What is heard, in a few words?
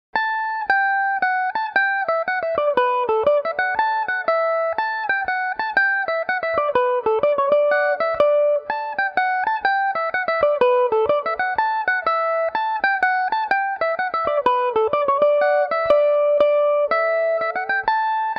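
D major revelation, irish mandolin; jazzing with mag leary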